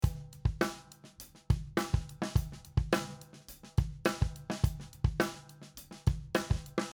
Snare phase cancellation

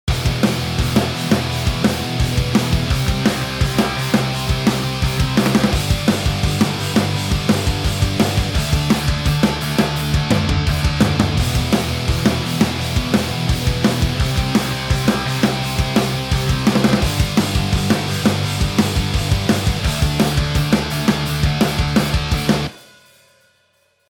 Simple Power Chords

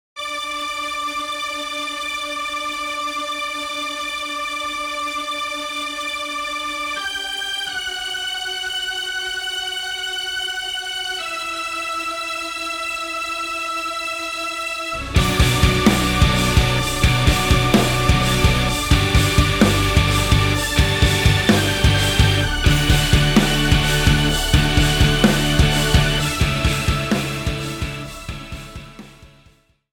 After Distortion

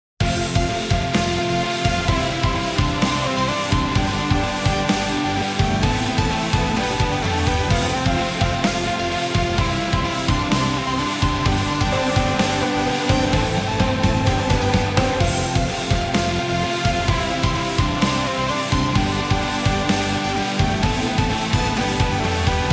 Oo oo filter on

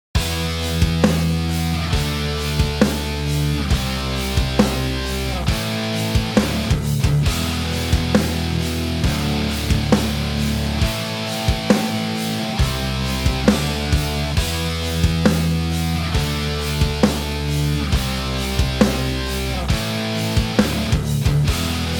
8 Bar

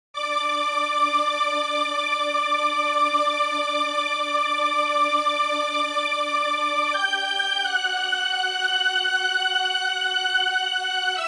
Adjusted Synth Preset

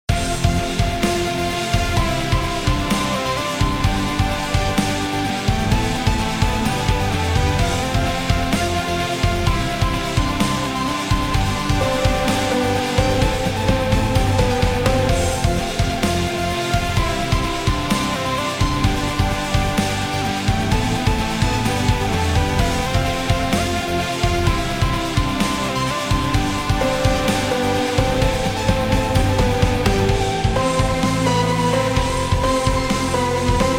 Oo oo filter off

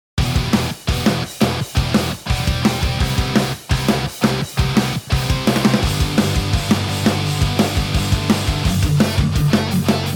Short riffs

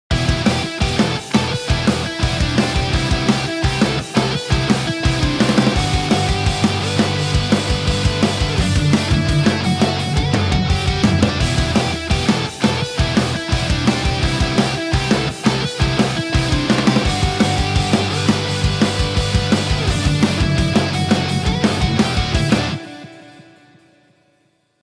Adding Lead Melody

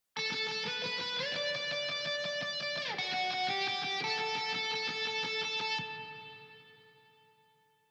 Only Harmonies

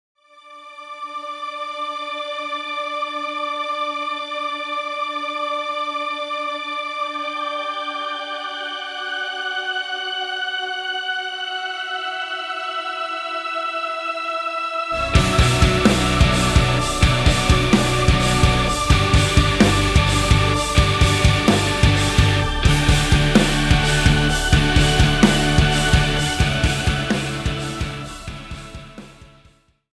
Synth Preset

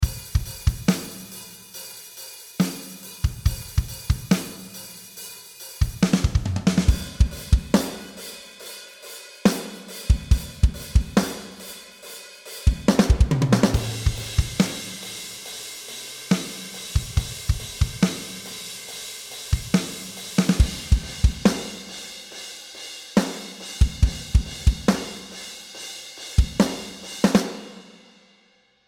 Drum AB test

